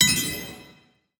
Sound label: ambient game ui notification positive pluck; esmteam